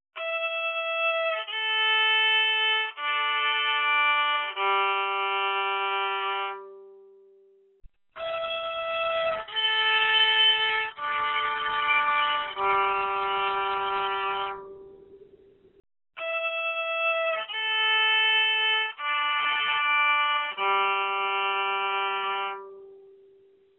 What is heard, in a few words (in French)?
violon MIC GSM transcodage